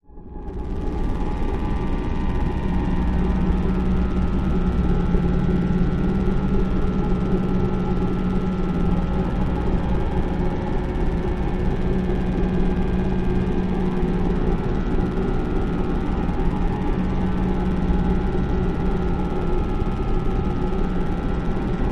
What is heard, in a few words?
Underwater Engine steady engine vibrations with high pitched buzzing accent